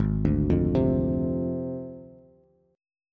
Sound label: Ab 6th Flat 5th Ab, Bass; arpeggiated; JGuitar